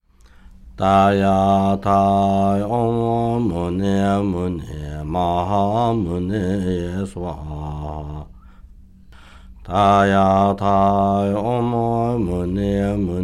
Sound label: Shakyamuni Buddha mantra fast